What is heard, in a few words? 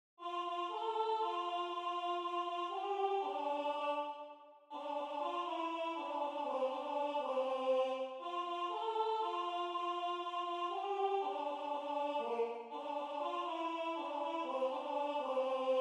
ounenaya alto